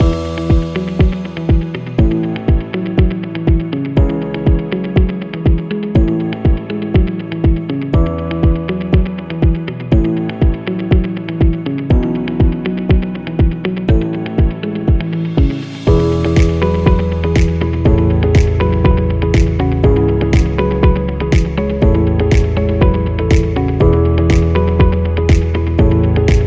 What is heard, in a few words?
Upbeat Loop Corporativo; service business